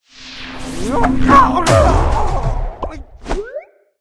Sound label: mob real bear attack, monster